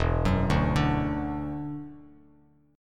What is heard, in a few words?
E 7th Suspended 2nd, down, Bass Standard; E7sus2; arpeggiated